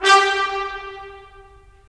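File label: brass trumpets staccato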